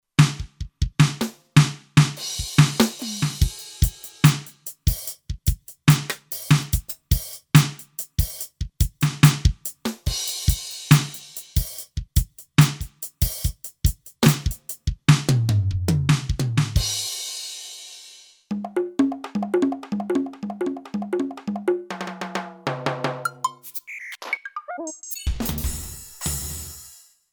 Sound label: General MIDI Kit, Kurzweil K2500X; Factory Programs